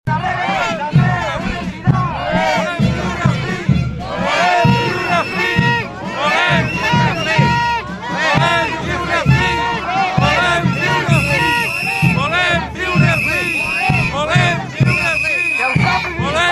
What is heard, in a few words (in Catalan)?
SO AMBIENT; VOLEM VIURE